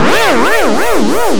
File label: fx loop, big beat; Big Beat Samples & More; AKAI; FX LOOPS KIT